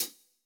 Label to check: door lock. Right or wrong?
Wrong